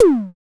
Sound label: DSM2 Tom; MPC DSM-2